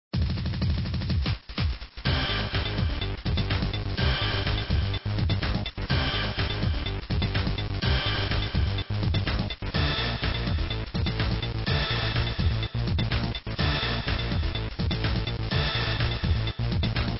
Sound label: techno gun; apidya